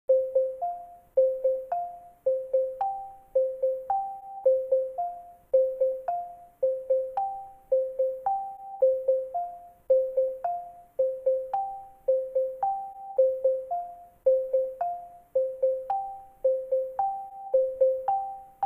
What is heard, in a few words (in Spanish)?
Xilófono